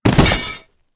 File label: bodyfall soldier; smite; medusa